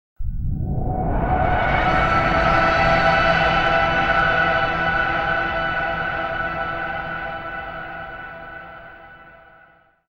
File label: Wrong Answer, Game Show Sounds; Russian Roulette; Cue for a wrong answer in the Killer Questions round.